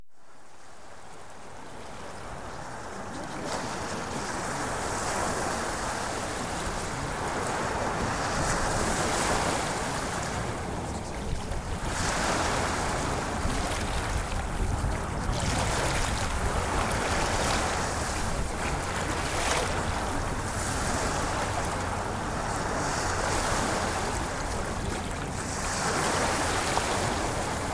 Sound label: Ocean Waves